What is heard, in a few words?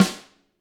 GRETSCH SNR; ILIO; Double Platinum Drums 1